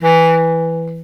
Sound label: Bass Clarinet E2; VarazdinOrchestral